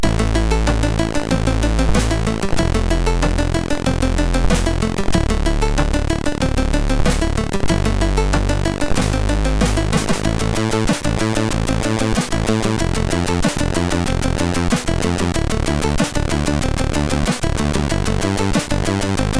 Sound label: Intro, sid MOS6581R3; Sweet Cosmo